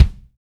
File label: XV Kick, Roland XV-5080